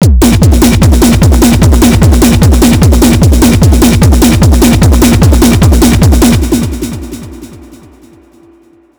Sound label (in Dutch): Techno proef